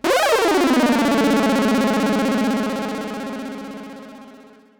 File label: fx; Google; NewWave Labs